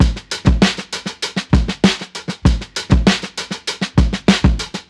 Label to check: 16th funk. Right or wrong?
Right